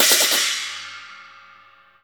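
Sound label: CHINA LG3 R, China cymbal; Spectrasonics; Heart of Asia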